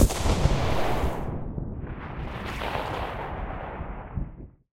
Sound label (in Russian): manevrennij polet zapushennoj raketi